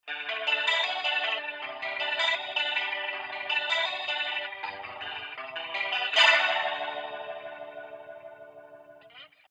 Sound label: leslie; amplitube